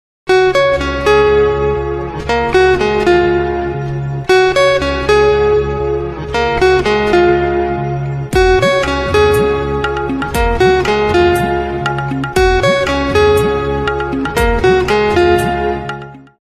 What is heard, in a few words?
BGM Guitar